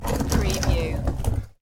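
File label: Short Cart Roll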